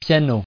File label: piano